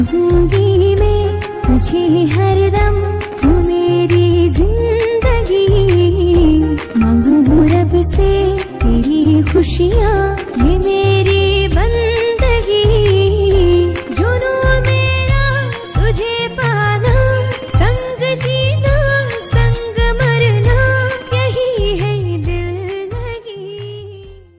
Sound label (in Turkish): zil sesi